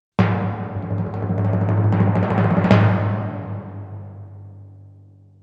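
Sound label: percussion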